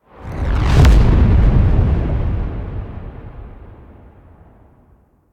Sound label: Disappear; Warframe; Sacrifice; Forest Night